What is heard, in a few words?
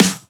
good snare F sharp key